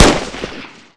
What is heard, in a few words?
aksu fire